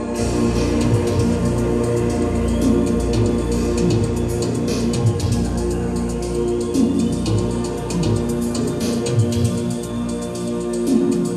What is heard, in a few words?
Octapad Solo